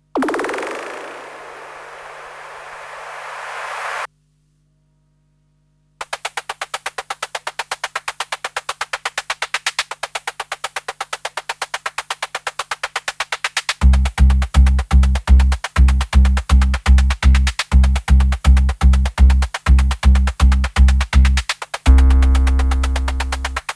S100 Noise Perc Subbass

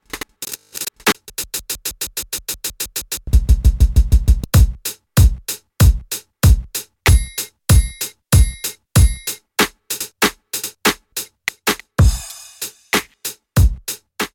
slice Loop

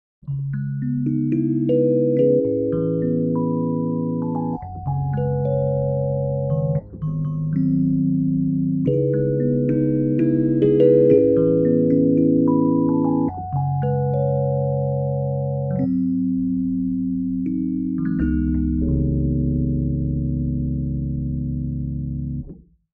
1st Harmonics

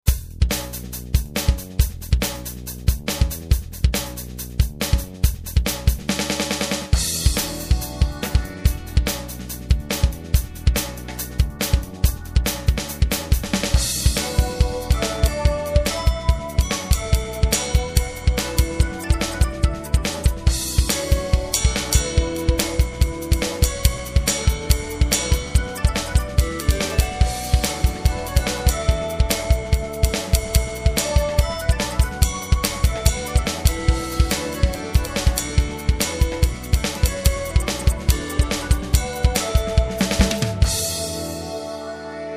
New Age Jam

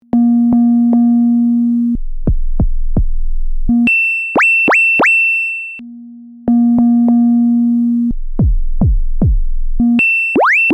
SWEEP; MSW

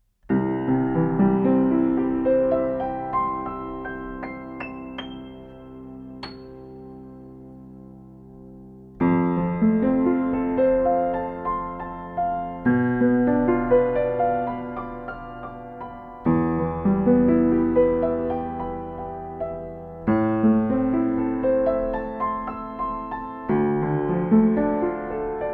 Piano Zoom F8 Coles4038

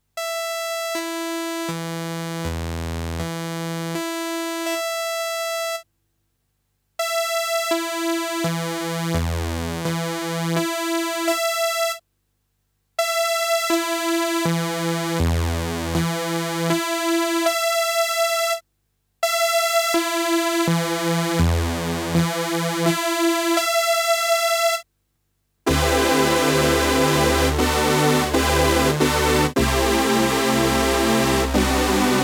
Detune DCO 1 4

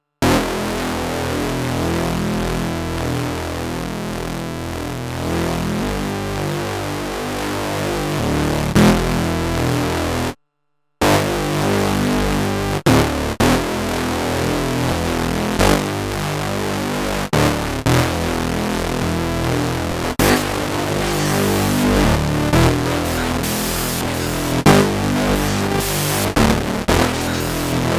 Waverazor Dual Oscillator Swarm Saw; MOK

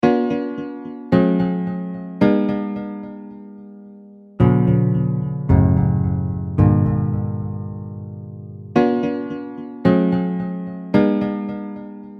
Guitar Delay

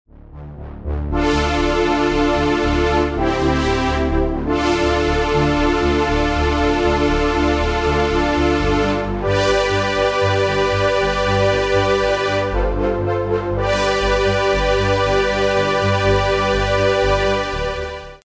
80s Pad

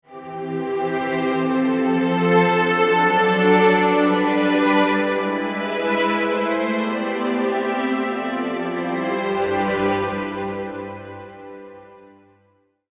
Adagio Expressivo

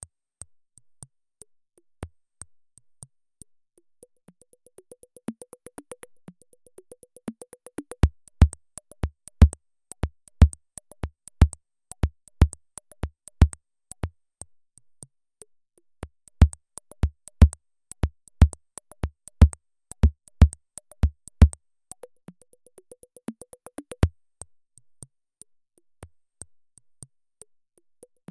hand drums Piano

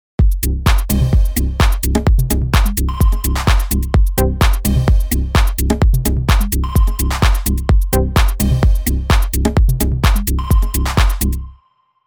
Zoom ARQ Minimal

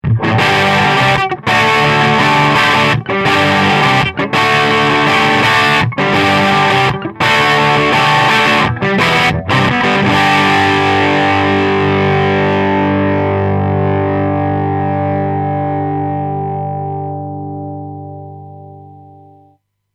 6V6 Crunch

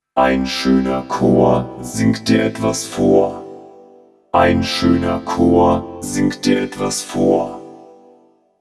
VP550 Vocoder

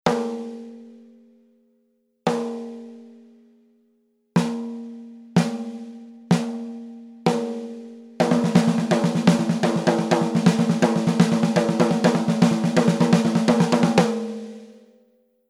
Snare Mapex Rimshots SM57

